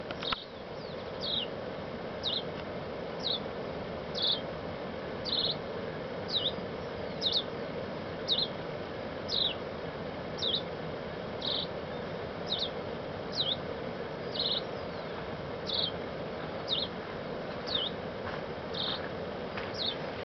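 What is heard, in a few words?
Unknown bird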